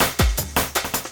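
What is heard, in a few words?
loop snare drum, drum & bass, 160 BPM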